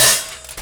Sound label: Prepared Drums